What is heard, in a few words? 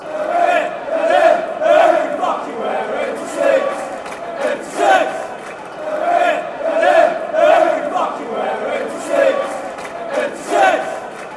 empty seats, fan chants; atfc